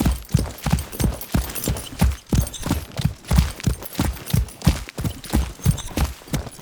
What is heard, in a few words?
Palanquin Step Loop; Fallen From Heaven